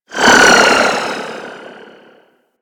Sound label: Grineer Pursuit Space Ship Mine Proj By